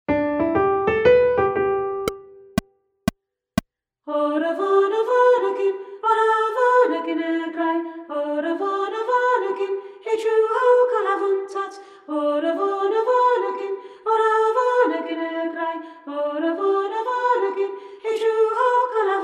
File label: unison